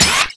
alteration ammo gun change start, weapons

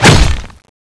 axe wall stone, weapons

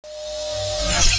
dual sword skill off

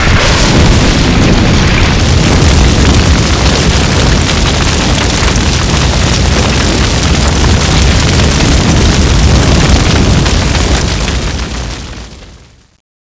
bunker buster fire, weapons

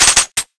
halo gun shoot charging end, weapons

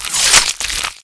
janus change, weapons

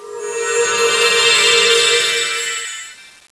magic knife charge start, weapons

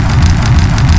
skill rolling; dione

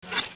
patrol drone drone fly

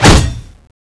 axe wall metal, weapons

